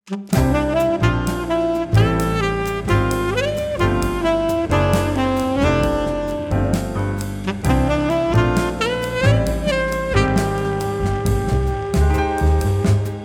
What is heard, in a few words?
jazz stream